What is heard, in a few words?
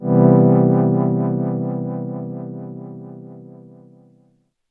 VIB PAD MAJ, Synths; JUNO106